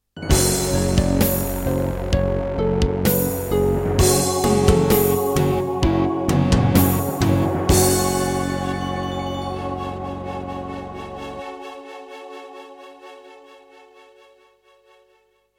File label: Introduction, Roland PMA-5; Doom (Sigil)